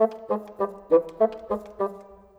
Bassoon, Orchestral; Sonic Foundry (Sony Creative Software); Orchestral VOL-2

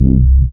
SYN SH, Roland; Sound & Vision - Gigapack I CD 2

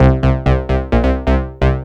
BAS, 2 Step

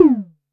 vermona drm1 mk3 tom

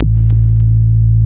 WALL MOOG, Synthy Tonal; Distored Reality

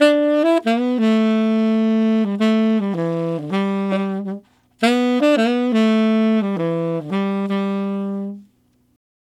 Tenor Swing in G Maj, Tenor Sax Loops, Jazz; Sonic Foundry (Sony Creative Software); Jazz Solos and Sections

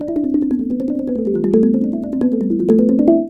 RANATTHUN; Spectrasonics - Heart of Asia CD2

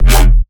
bass hit Dirt E; Virtual Riot

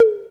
Percs, Elektron MachineDrum; SPS1 MKII

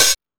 Techno D; EMU XL-7

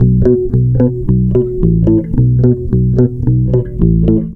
bass, Roots Funk; USB Soundscan vol.41; AKAI

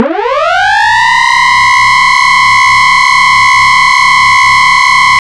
FX WRNING; ModelCycles

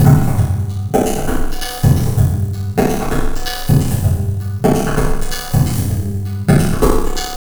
Tabla, 130 BPM; Zero-G; Pure Tabla

